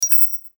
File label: Glitch Bell Light Ring; Glitch Sound Effects; Production Element Imaging Element Accent Transition